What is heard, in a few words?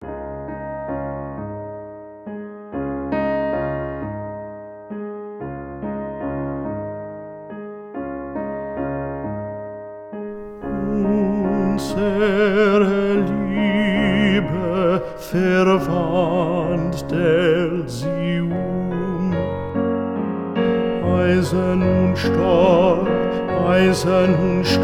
bass 1